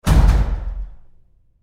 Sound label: Door Bathroom Stall Close Roomy Hard